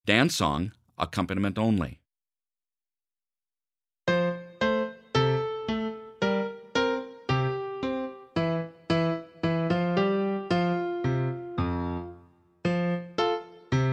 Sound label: fhorn dance song piano only